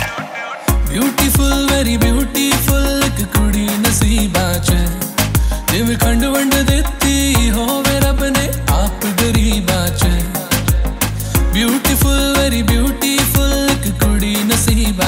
beautiful official music ringtone, bollywood ringtones